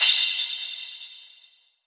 Crash, Cymbals

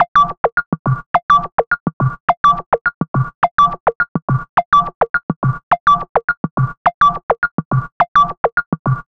105 Breaks; Thinna Cak

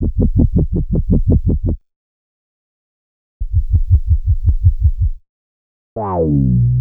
Bpm141 Bass Loop Em; Serotonine; Prime Loops; Dubstep Illusions

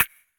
Percussive

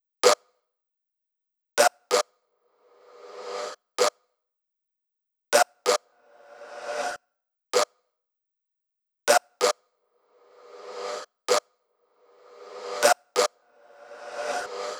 Techhouse Kit Melody; Mutekki Media; Vengeance Electroshock Vol.2

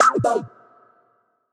E stab; Loopmasters; Funky Underground Vol 2

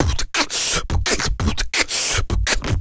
Human Beat Box, Drum & Bass; Loopmasters

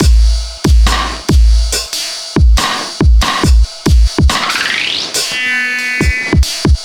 Hover Break, Bpm 140, Dubstep, Drum Loops

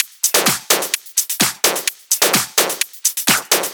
Loop, 128 BPM

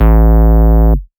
Bass Shot A; Mutekki Media; Vengeance Electroshock Vol.2; Bass Shots

